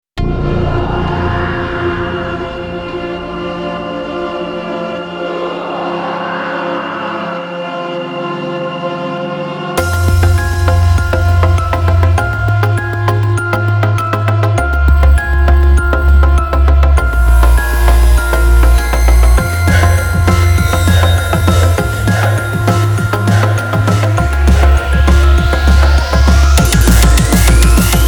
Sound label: Zombie Hunt 100bpm KEY f